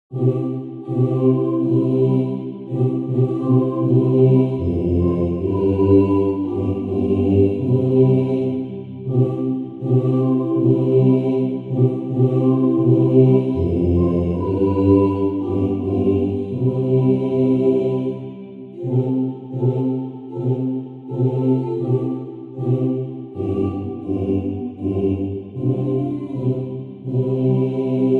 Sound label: bass